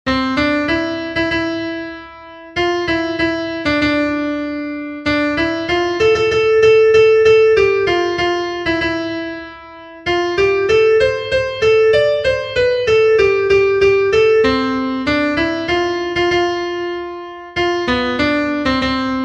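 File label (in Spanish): SOPRANO, himnos en piano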